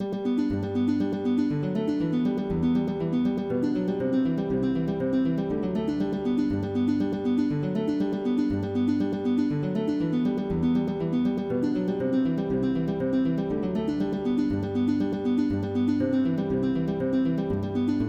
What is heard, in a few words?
Aria di Firenze arpeggiata, MIDI